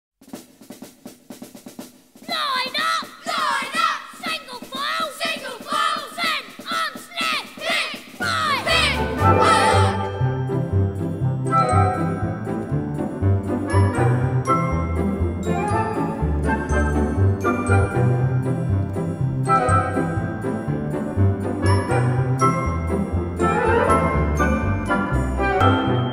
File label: Instrumental Track; FAGIN